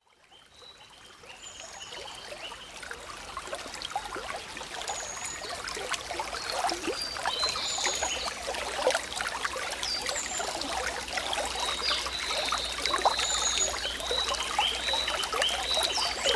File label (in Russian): MELODIYa I ZVUKI VODY